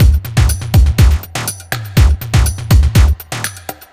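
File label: 122 Old Skool Jam No Snare, Drum Loops; 122bpm; Nu Dance Electro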